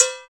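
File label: PER PROCU, Procussion, percussion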